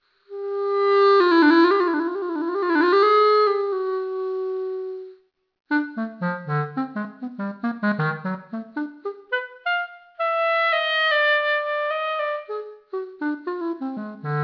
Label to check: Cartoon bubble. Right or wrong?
Wrong